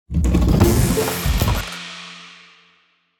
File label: treasure chest open; Minecraft